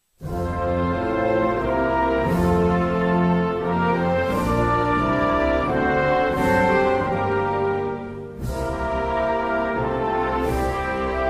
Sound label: National Anthem of Austria